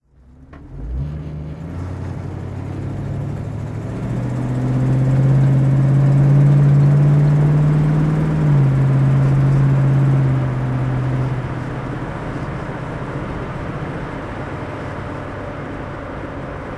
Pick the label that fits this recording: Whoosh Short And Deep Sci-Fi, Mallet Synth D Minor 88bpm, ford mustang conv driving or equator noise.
ford mustang conv driving